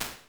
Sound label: Studio, Ambience and Small Rooms; Ableton; Convolution Reverb